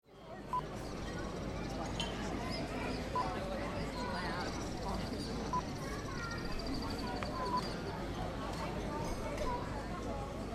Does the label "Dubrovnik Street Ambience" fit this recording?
Yes